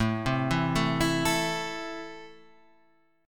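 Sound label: A Minor, Guitar Standard, chord strum; JGuitar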